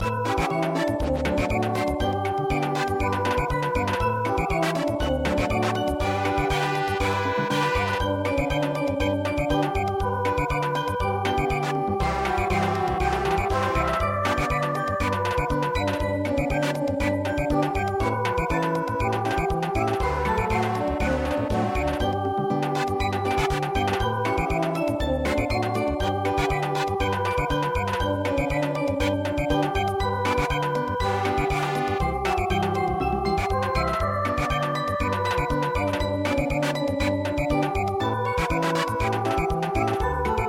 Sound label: Samba